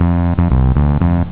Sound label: noise